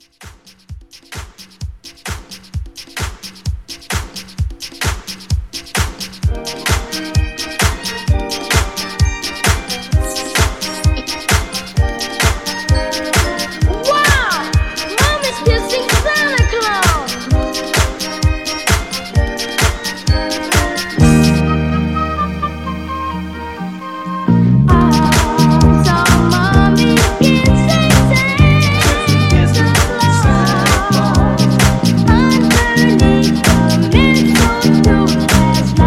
Christmas Re Drum 130

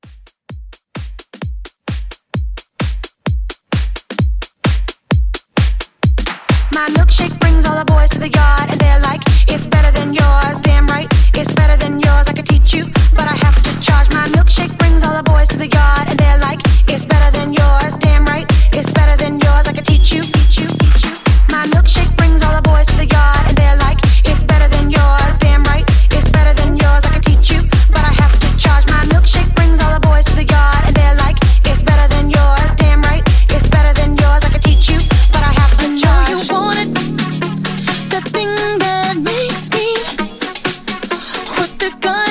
Club Mashup

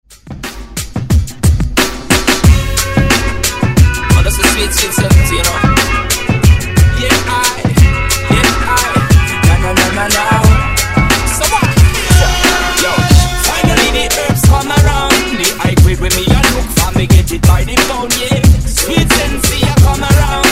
Reggae Ext Redrum

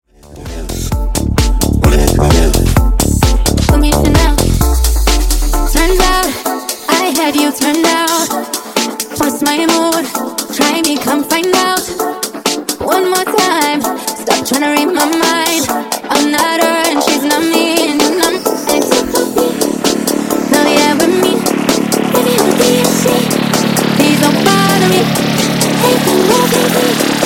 Dizko Funk Remix 130bpm; remixplanet

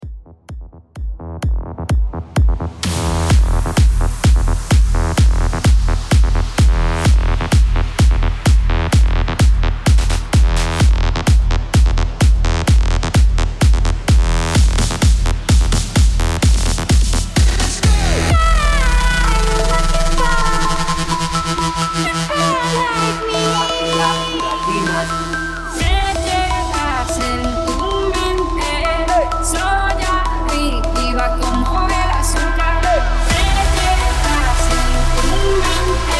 Mashup 128BPM; VIP MASHUP EDIT BOOTLEG 2021

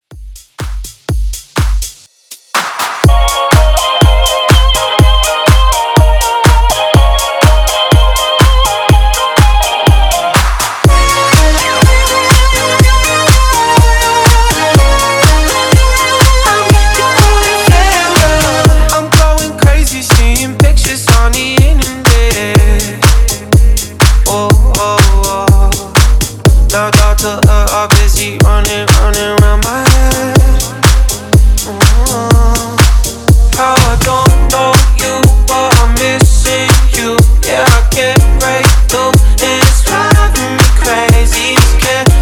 Re Drum Top40 Dance Uptempo 123 BPM

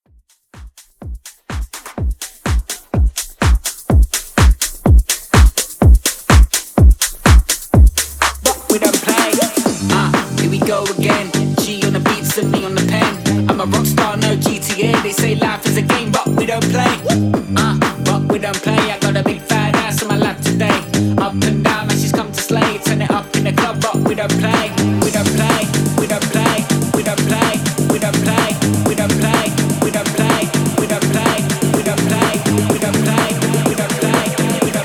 house

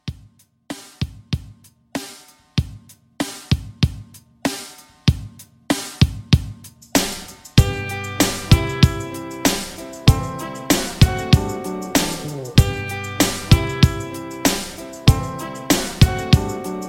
60s Soul Re Drum

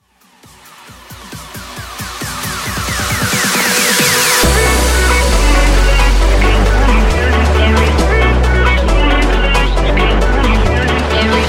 Top40 Vina House

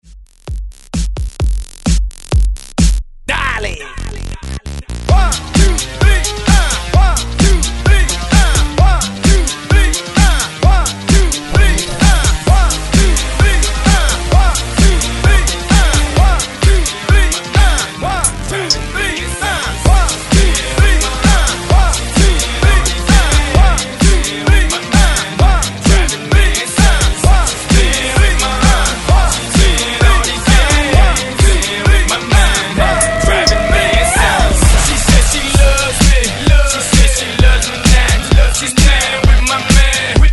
Hype Re Drum 130Bpm

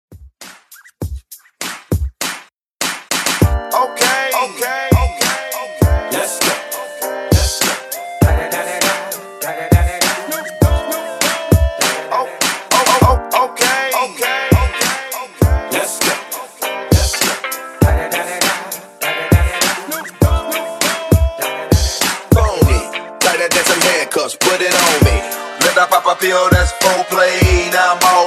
100 BPM; ReFresh Hook Mixshow In Outro

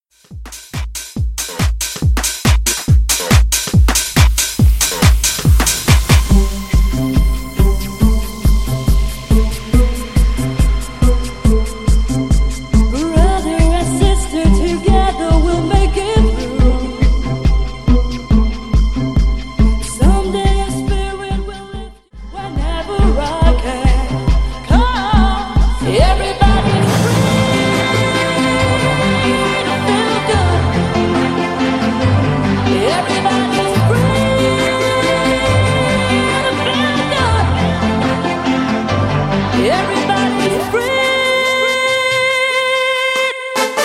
90s Hyped Remake; Remixfreakz

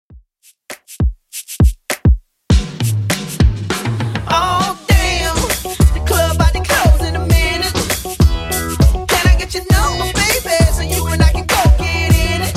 Throwback Rnb Redrum 100bpm

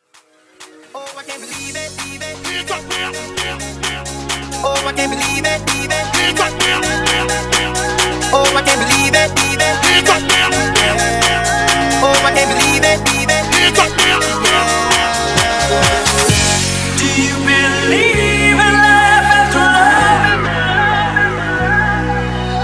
Clap Intro Hype ReBuild 130 BPM

